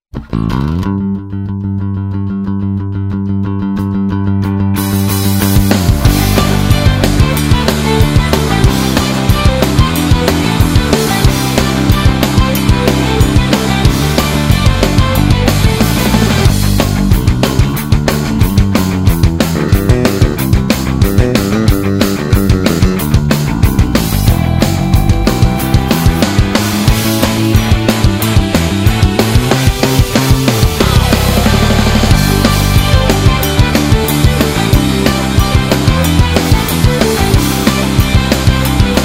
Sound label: pick punk rounds, lakland